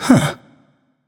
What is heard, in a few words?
notice; cupid